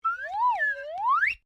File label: Whistle Slide Wiggly, Simple slide whistle; Musical